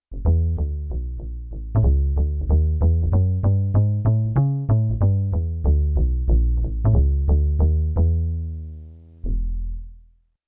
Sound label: ACOUSTIC BASS; Yamaha TX16W; Jazz Performance